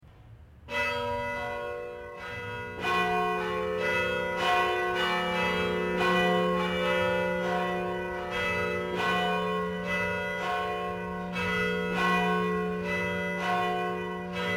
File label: Church Bells; St Jude 2022